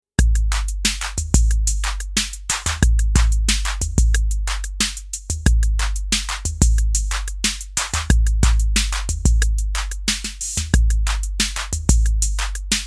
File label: Acid 91 bpm; Acidlab; Miami